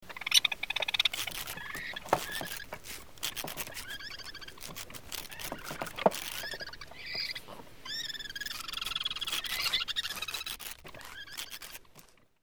rats scratching

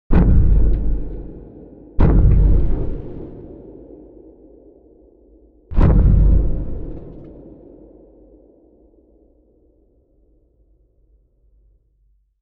cannons

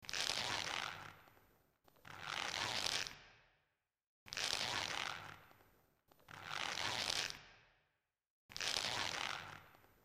hanging swinging

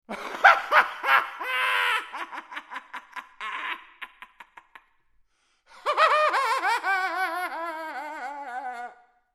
laugh insane

